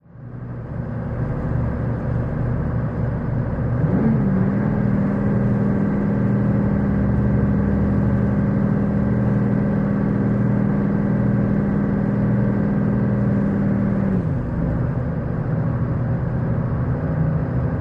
Military Pbr: Onboard Slow Speed, Steady. Some Water Movement. Dual